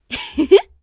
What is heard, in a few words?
Laugh; hero voice clips